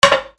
BATHROOM

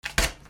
RIPPING

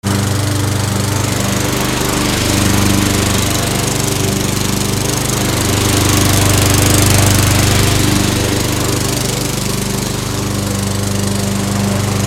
GARAGE

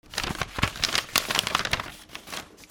OFFICE

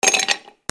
KITCHEN

